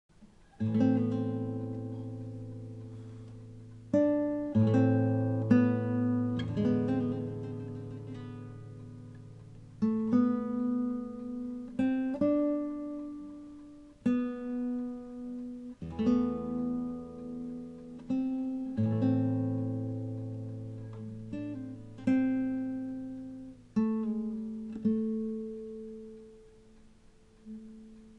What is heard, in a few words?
dirge, guitar